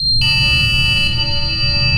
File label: sonar Ping Water Medium Beacon; Barotrauma; Real Sonar